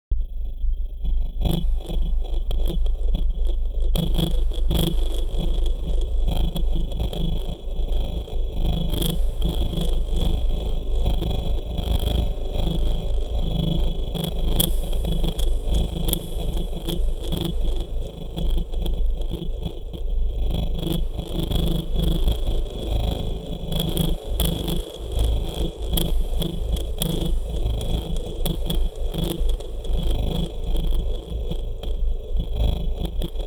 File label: Bitcrushed, Ambient; SFX; Ambient - General